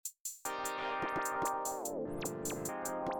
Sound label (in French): first drums soun